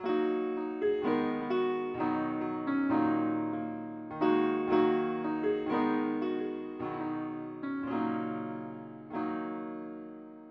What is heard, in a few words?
piano